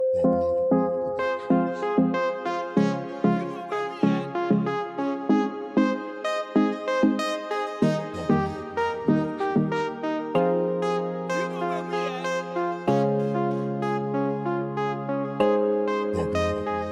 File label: Latin Reggaeton